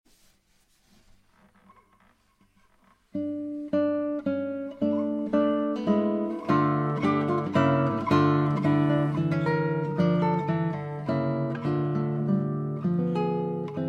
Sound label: Classical Guitar; se electronics 4400a; میکروفون استودیویی و حرفه ای اس ای الکترونیکس 4400a